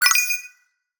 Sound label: play click; wbg games; risk coin